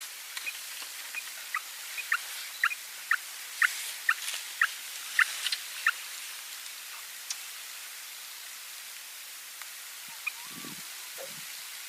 canto do mergulhao do junin

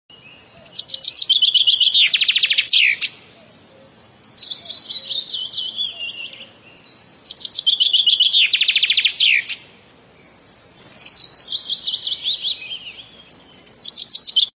canto do myna pied indiano

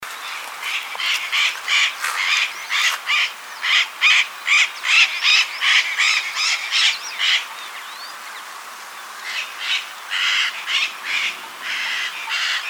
canto da aratinga de testa azul

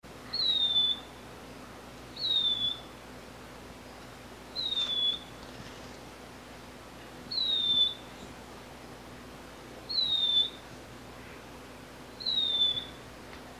canto da choquinha cinzenta

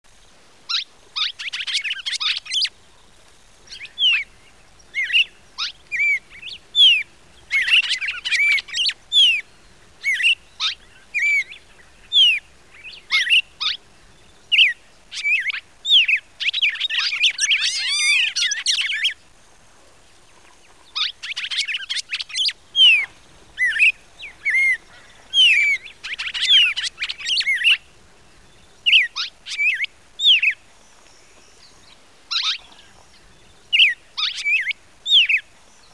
Canto do Cardeal do nordeste

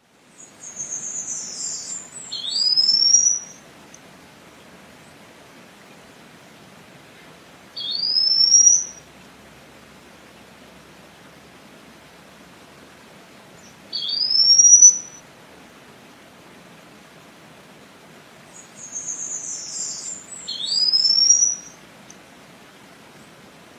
Canto do dancarino olivaceo

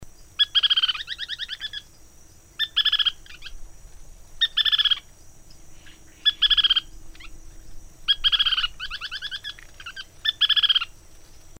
canto do joao de peito escuro

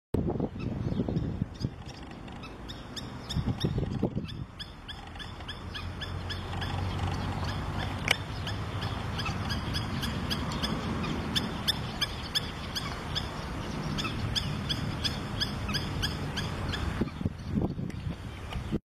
canto do abibe esporado

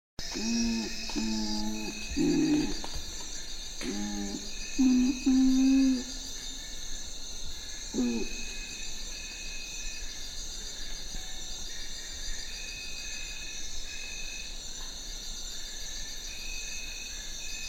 canto do urumutum

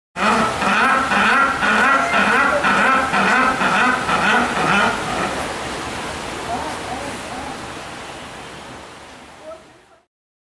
canto do pinguim

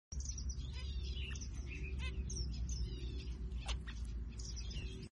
canto do granadeiro purpura

